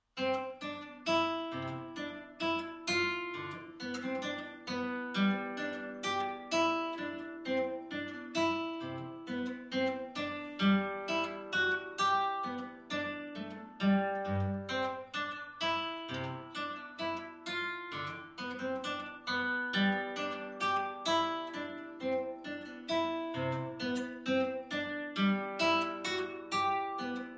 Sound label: Gavotte